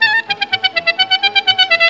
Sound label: CLARI GROOVE; Best Service; World Colours